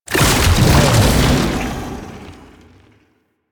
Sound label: Proto Infested Babau Underground Attack Cast, Enemies